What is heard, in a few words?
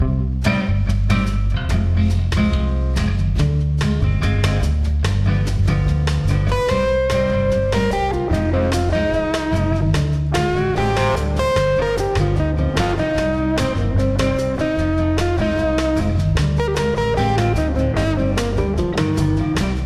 Smooth Bossa